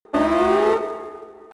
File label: alarm